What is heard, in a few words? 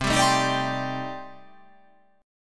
C Minor 6th Add 9th C